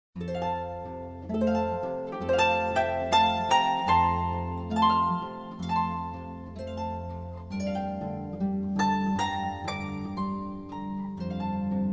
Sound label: Instrumental Harp Praise; Saviour, like a shepherd lead us